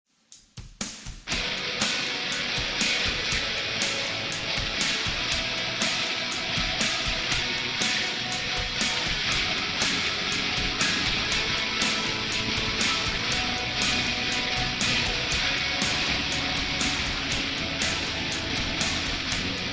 ROCK RIFF Residual Noise; MP3 64kbps

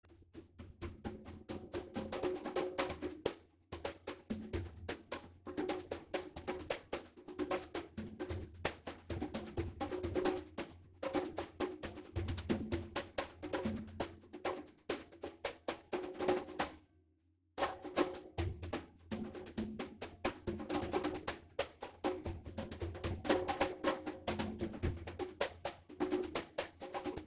CONGAS Residual Noise

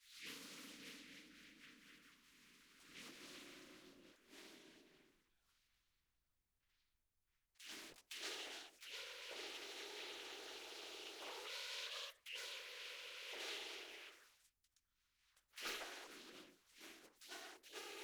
Residual Saxophone Recording

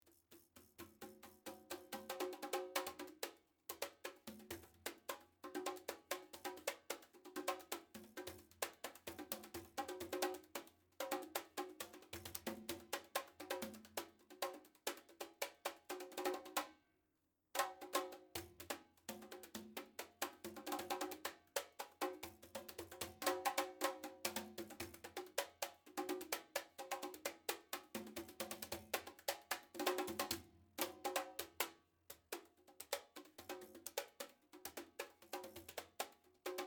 CONGAS Residual Noise

